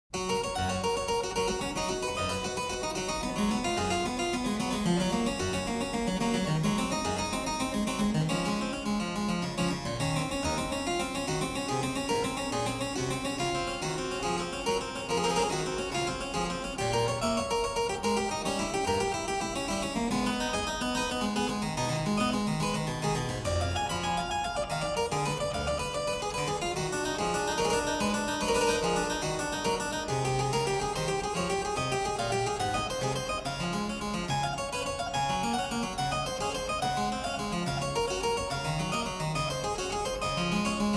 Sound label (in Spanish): Clavicémbalo; Bach